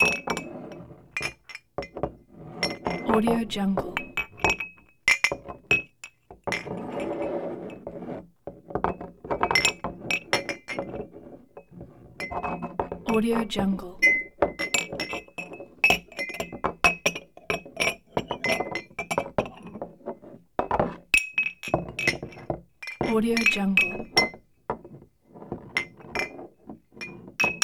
glass clinks

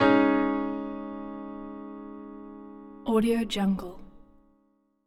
piano chord g sharp

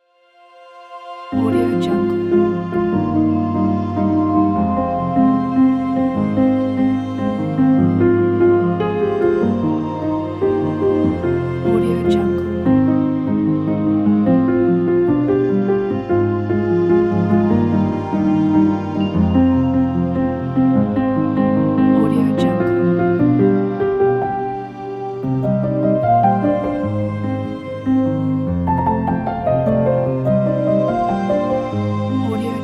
inspirational piano

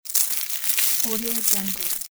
crackle

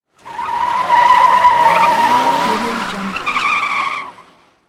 car passing by with tire squeak

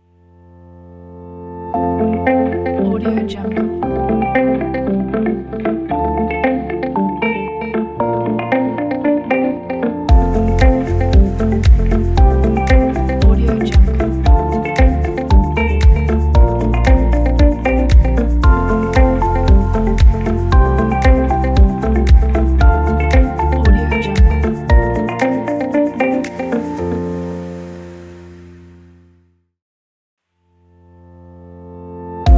soft corporate logo ident

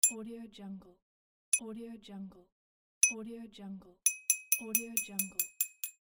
clink glass

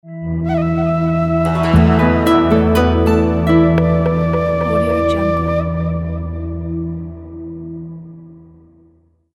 ancient logo

